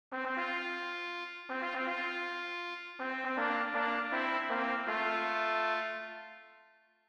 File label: fanfare; musicbox